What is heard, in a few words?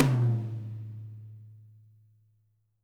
TOM, WARM FAT KIT; Spectrasonics - Burning Grooves CD2